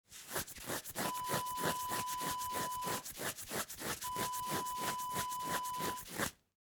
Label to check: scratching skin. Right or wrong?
Right